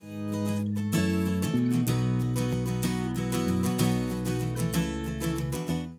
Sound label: acoustic